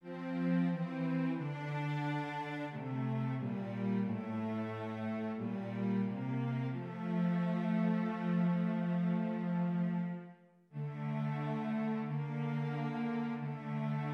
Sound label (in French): VIOLONCELLES